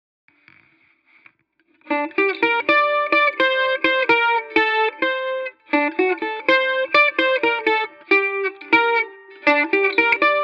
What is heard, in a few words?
- Theme mandolin